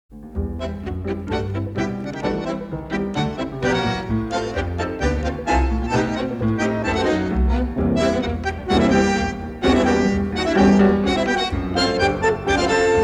bandoneon

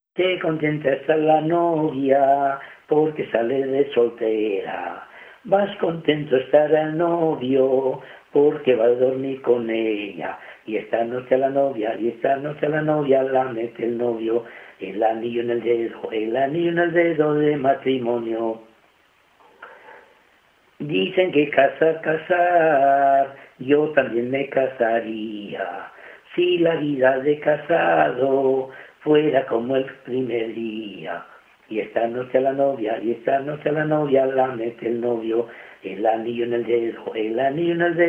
Jota de bodas Llano de Olmedo

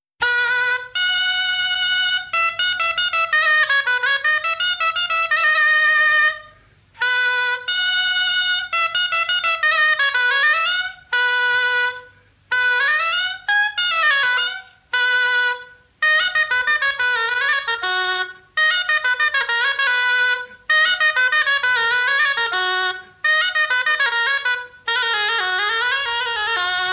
Mudanzas de Curiel de Duero

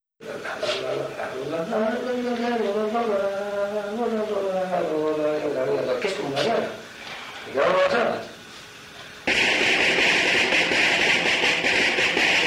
Ritmo de las habas; Pesquera de Duero

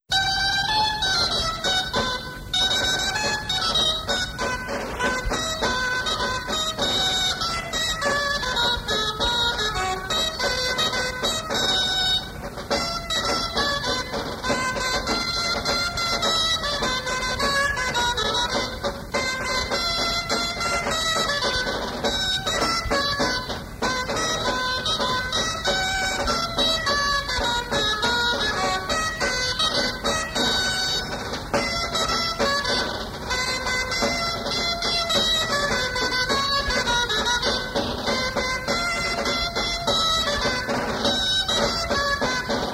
Contradanza de Berrueces de Campos

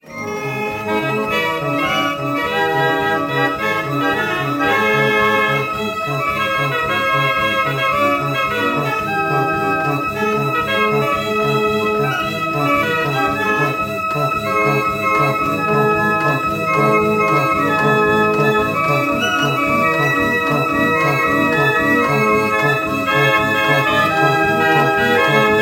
organo de salon, instrumentos mecanicos; Un cilindro de púas accionado por una manivela permitía que unas llaves se abriesen y cerrasen para dejar pasar o cerrar el paso del aire

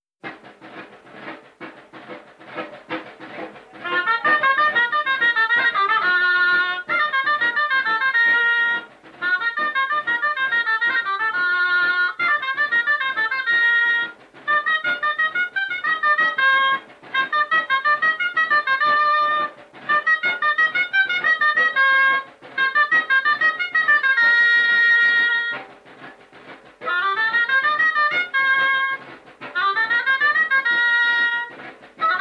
La entradilla Pesquera de Duero

